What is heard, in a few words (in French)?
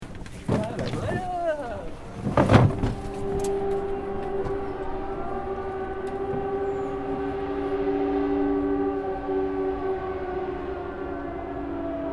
sirene; malakoff